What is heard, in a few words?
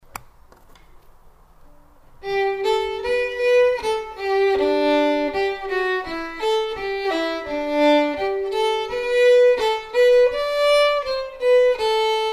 Accordion and fiddle; Kelso